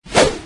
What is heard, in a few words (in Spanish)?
latigo latigazo